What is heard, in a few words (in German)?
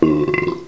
ruelps